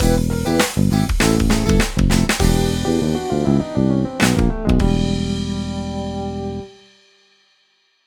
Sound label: Fail; super tennis blast; Fail Master